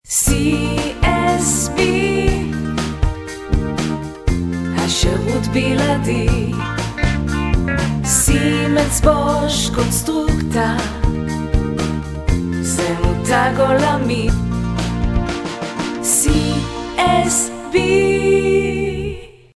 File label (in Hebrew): גינגלים